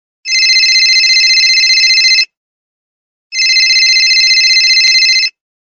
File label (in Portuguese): som de telefone